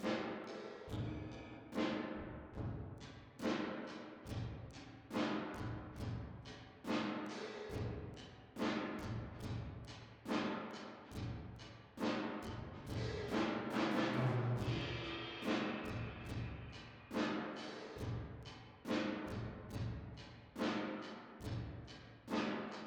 Drums Verb

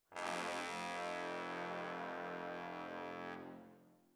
ORTF, Jazz for piano

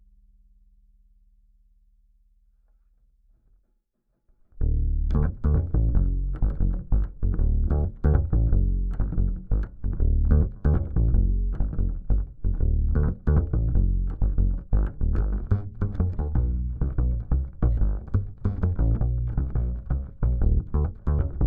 Bass DI

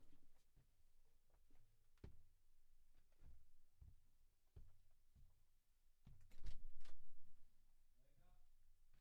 Drums

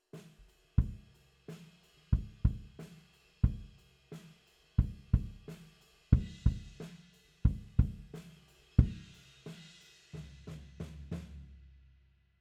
BD OUT

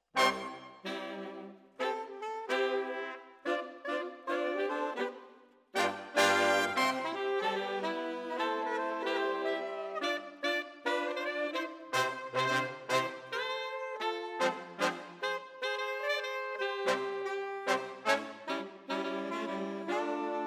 Brass

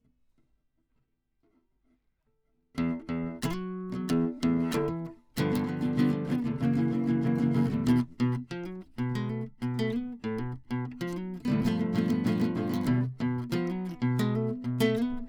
Classic Guitar

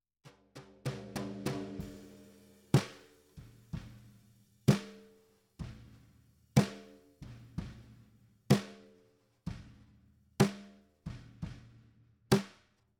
SNR Top

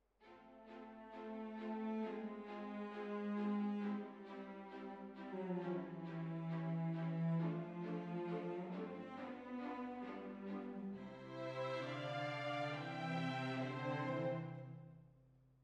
Hall, Jazz for piano

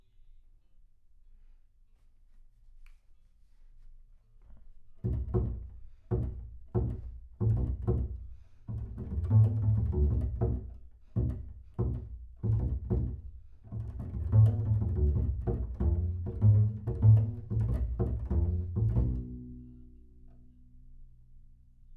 Bass Room

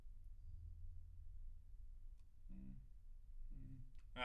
Room A

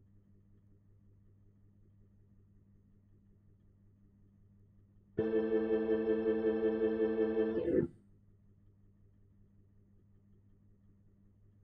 Hammond

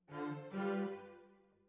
Cellos

